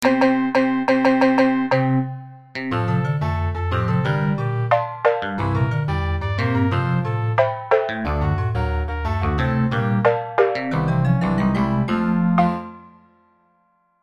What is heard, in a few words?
ringtone playa